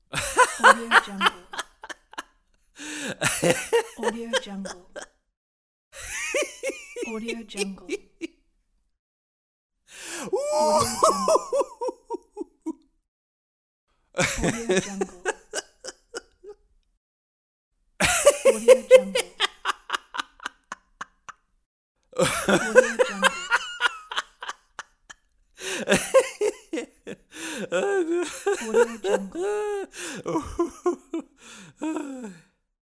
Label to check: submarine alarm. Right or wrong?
Wrong